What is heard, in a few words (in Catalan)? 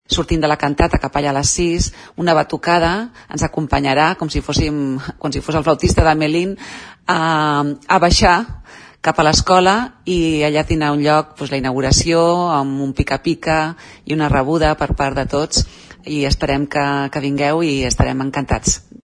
batucada; merce